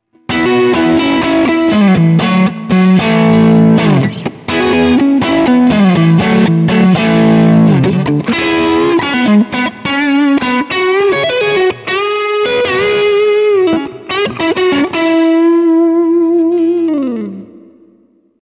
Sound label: Fender AM Orig. 60 Neck Crunch